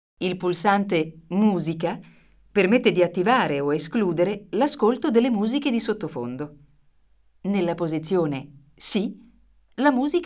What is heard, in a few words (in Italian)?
musica; Manet; tutorial; Parlato